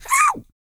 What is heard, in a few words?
E YELL, MALE HUMAN; ILIO; Vocal Planet